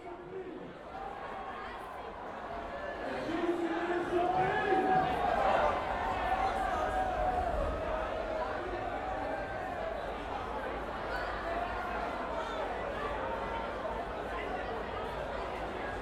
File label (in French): AMBIANCE MARSEILLE DOCKS CONCERT FETE FOULE DISCUSSIONS VOIX; AMBIANCE MS; DOCKS DES SUDS ASSOM; MUSIQUE